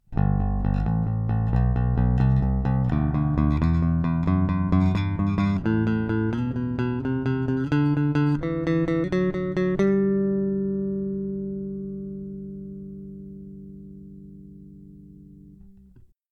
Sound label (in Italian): jbneo4 entrambi plettro aperto